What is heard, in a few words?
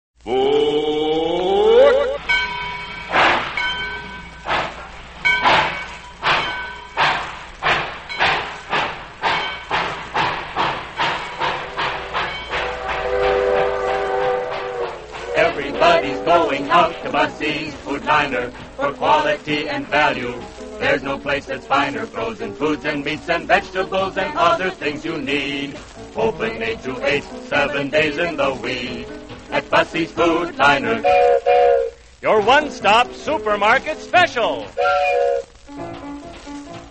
radio jingles; busses food diner; allied radio artists